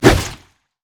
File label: pain; LoL; trundle